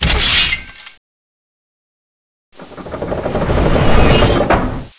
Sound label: gauss trap; dm clocktower